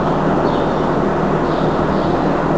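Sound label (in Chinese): 城市喧闹; 红色警戒2; 环境音效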